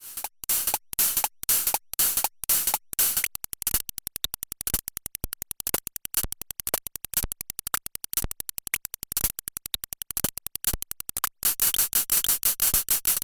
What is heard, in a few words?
Trigger Sequence phase locked LFOs; fourMulator